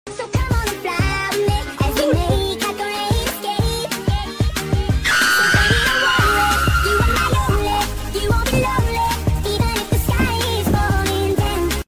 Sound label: burst laughing; kai cenat; audio meme